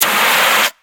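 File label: fire extinguisher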